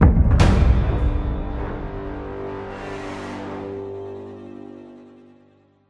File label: Sting Shock